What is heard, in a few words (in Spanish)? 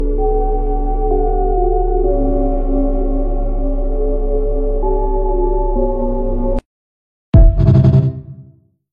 gato burro